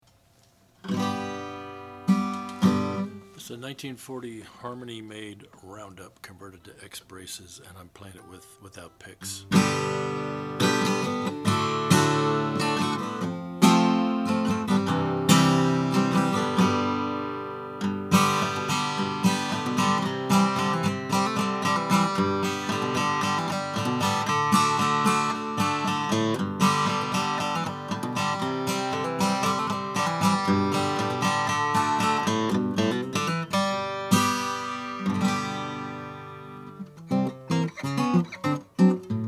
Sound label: harmony round up conversion, Guitars Acoustic Vintage Blues; 1940